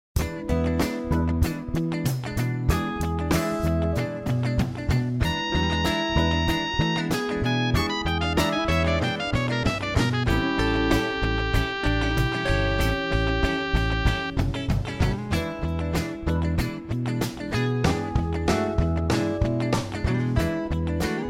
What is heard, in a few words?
E7 Diminished Dominant Pattern; Coltrane